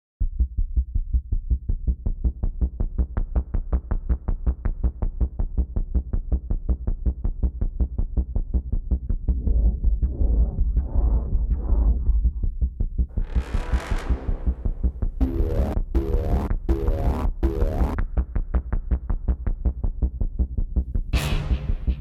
Combat Loop; DLC HarleyPolice

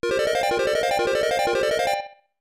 Hit; Hisshou 777 Fighter II; SNES

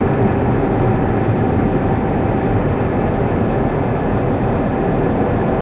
Jet Sound; Learn to Fly 3

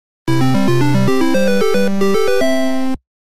Level Completed; Alleyway

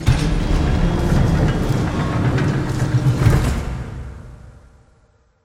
door open; Poppy Playtime

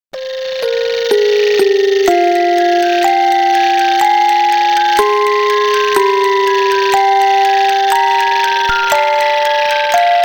musicbox; Shivers 2 Harvest of Souls

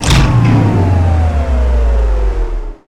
Machine Powerdown; Lucius III

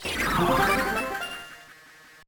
Stage Already Clear; Wario Land IV

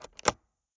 door unlock; Five Nights at Freddy's; FNAF 4

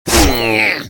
Pig Special; Angry Birds

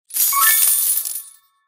Coins Many

